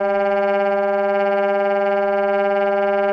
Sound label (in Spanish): Tremolos